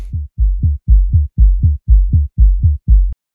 parallel saturation bass

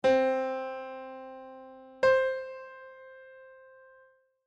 music intervals octave

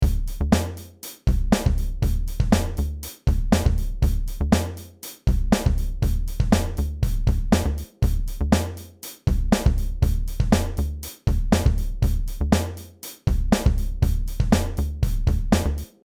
Parallel compression

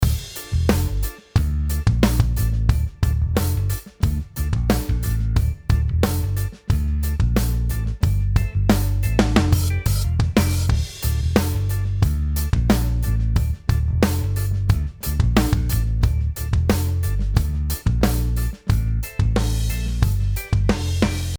Hi hat and cymbals

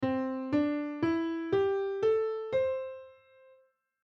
pentatonic major scale

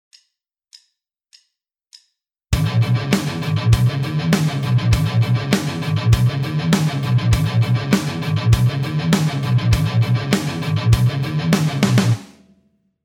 eight string guitar

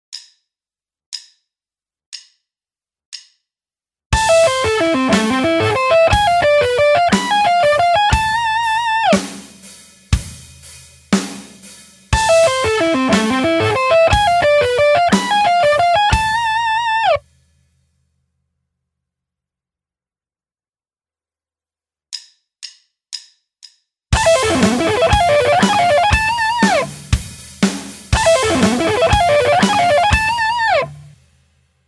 sweep picking

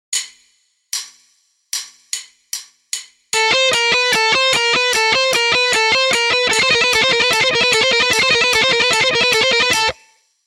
chromatic twister

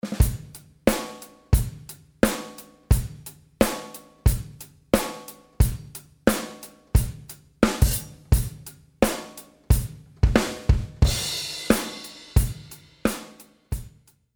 drum loop verb

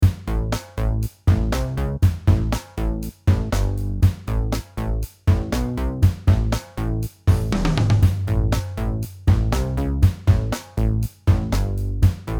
Sticks groove

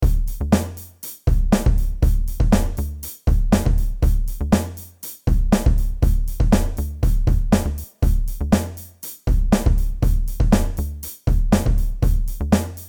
Compression distortion reverb

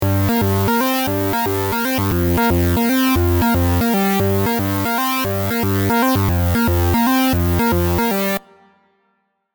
guitar synth

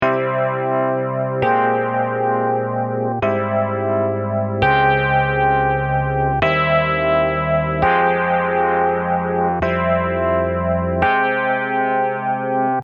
variation cm eb fm

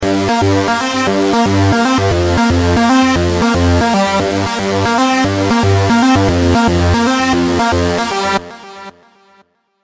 guitar synth delay

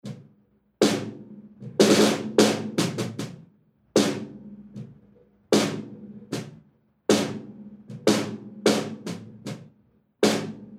drum snare reamped

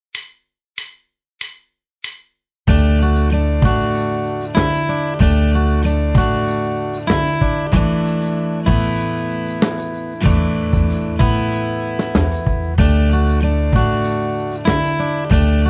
liven up chords

